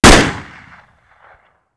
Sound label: 45 Pistol Fire; Weapons